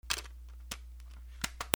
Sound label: HOUSE